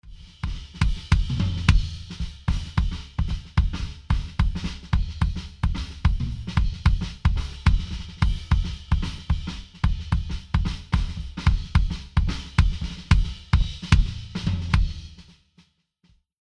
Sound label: Drums Kick Sub Bass On